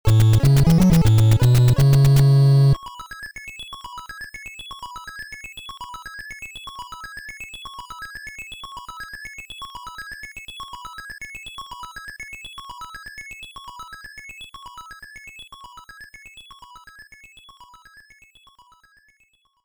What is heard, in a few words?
Stage Clear; kickle cubicle nes